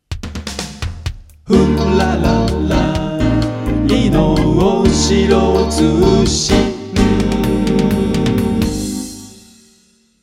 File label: News Jingle; Shiro Ino News Jingle 1 -2024 Mix- (Mono)